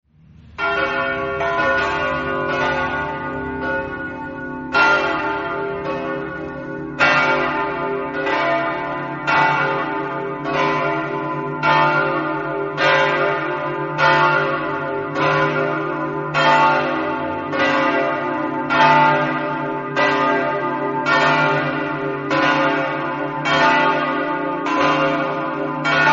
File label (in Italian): buttrio, campane